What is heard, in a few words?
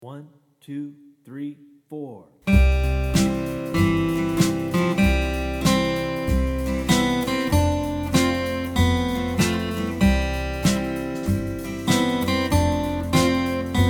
Slow Play Along, guitar